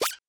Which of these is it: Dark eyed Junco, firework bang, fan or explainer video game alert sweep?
explainer video game alert sweep